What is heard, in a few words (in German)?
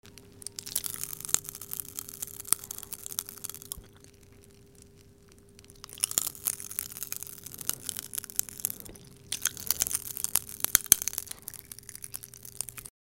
knister, waldorf; q